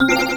Fast Bells; Crackanoid

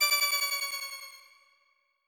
win close

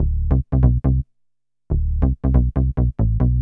Bass G; Techno Music Maker

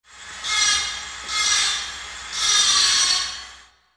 CIRC SAW, construction

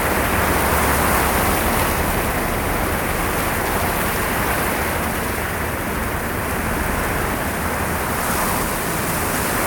Wind Trees Loop; kult

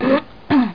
ahem

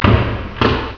Door Latch

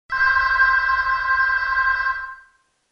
phone ring; WMBonusXP

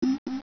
radar

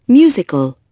musical

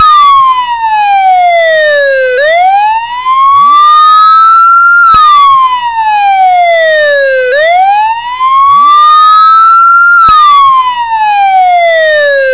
POLICE; Talking E-Mail

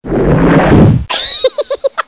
RUMBLE BOOM; DR BRAIN; BUGS

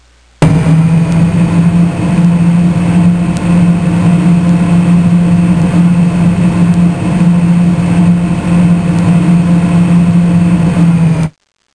Large Furnace Vent; Soundsation

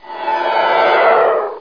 cloak

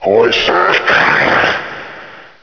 growl; HEXEN2; archer

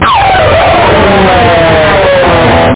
metal, guitar; da capo vol1

